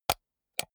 computer mouse wheel click slow hard

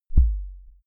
sub kick clean

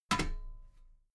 metal ladder step soft

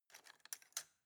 cutlery plastic drawer grab soft

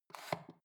foot switch dimmer slide up

